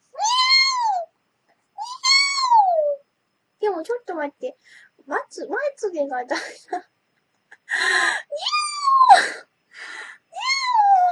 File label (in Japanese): 猫